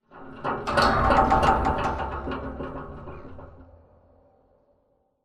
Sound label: metal stress; Titanfall 2